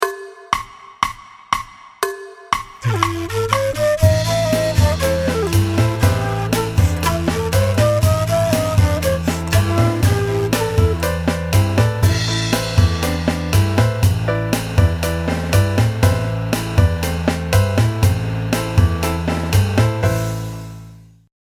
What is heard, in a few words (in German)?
Bluesttonleiter lick